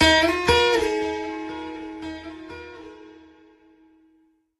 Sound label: surprise, alarms; Sony; Xperia M4 Aqua